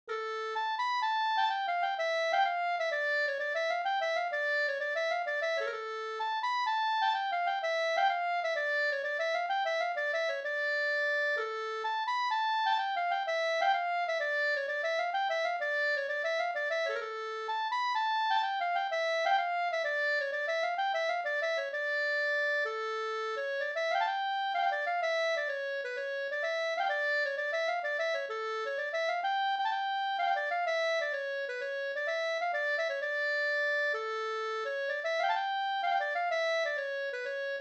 polska springlek

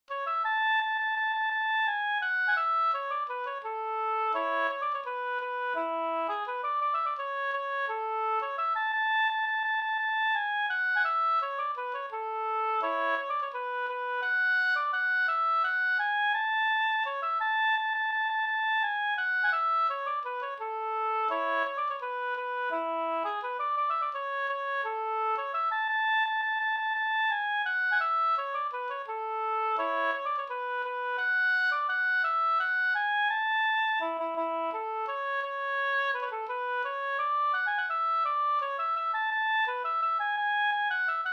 vals; Glaboln; Bjorkvik

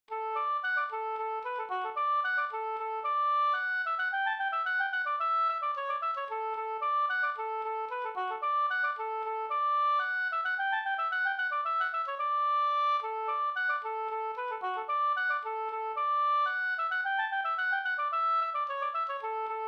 polska Blekingepolskan; e Viksta-Lasse

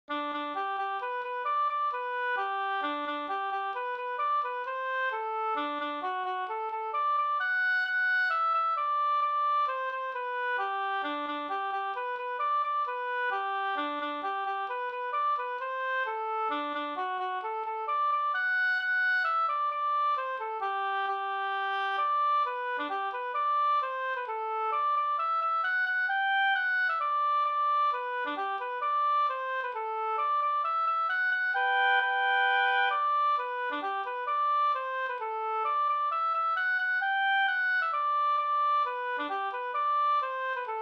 hambopolska

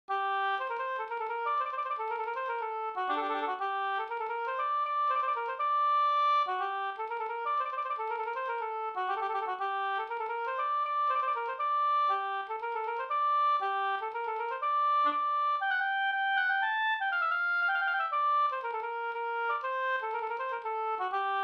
polska Bodapolska

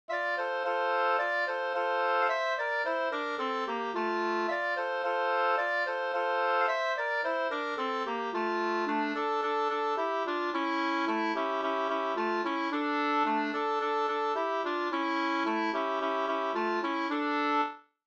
folkdans, 2st; Soutjärven katrilli, folkdans från Finland; med andrastämma

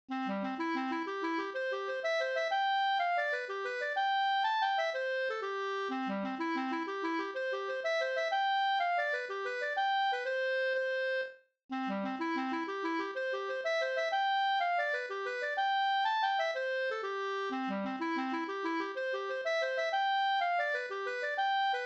hambopolska

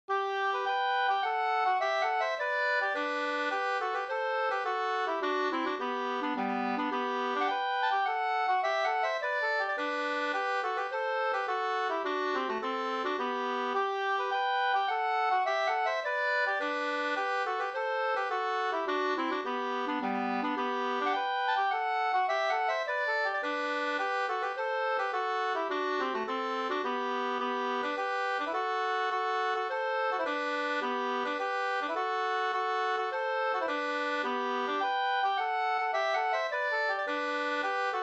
slangpolska

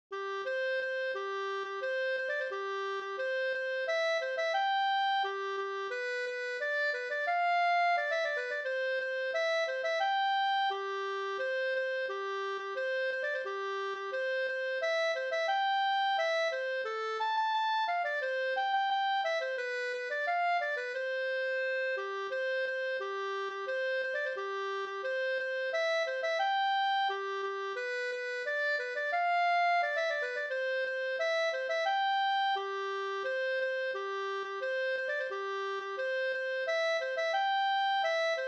brudmarsch; e Karl Svensk; Osterby

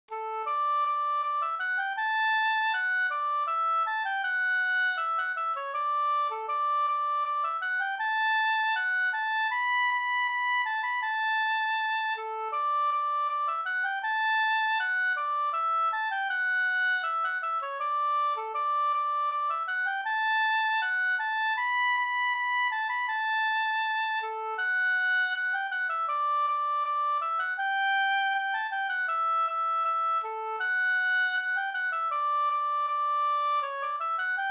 ganglat Marsch

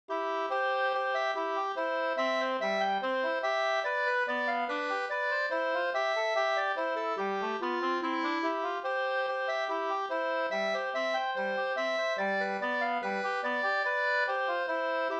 ganglat, 2st

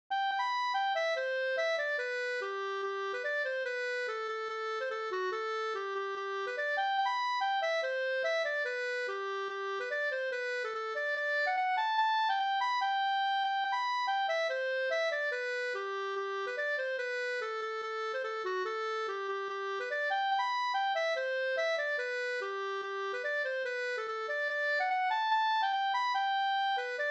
reinlender

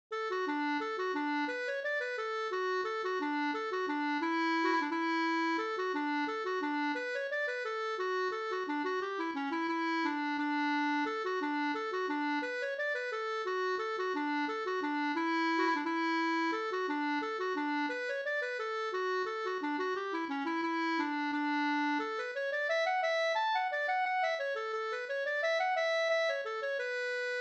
engelska; fr Tolg

